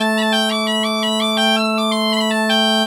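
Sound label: bagpipe 160, Celtic Flavours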